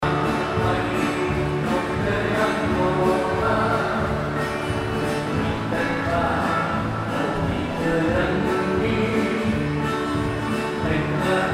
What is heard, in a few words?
Hula Music